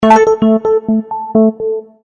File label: low battery, Sony Ericsson; Z310i